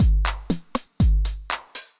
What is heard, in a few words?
Kit 909, Effected Drum Kits; Drum Machines; Ableton; Factory Packs